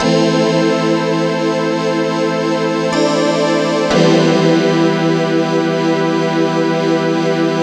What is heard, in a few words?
lop 126bpm, House Instr and Stab Loops